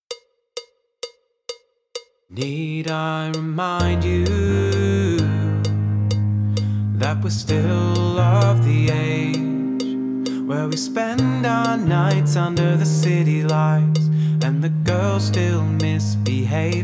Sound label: backing track with click